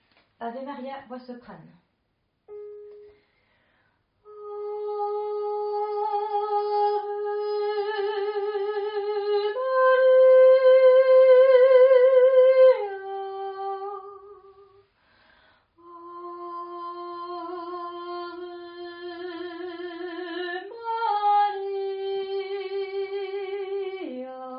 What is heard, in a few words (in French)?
Soprane; Ave Maria (Caccini)